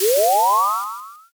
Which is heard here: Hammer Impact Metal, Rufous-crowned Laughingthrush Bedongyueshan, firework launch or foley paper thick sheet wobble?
firework launch